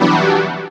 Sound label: DR. LOOP; X-Static Goldmine 3; CHORDS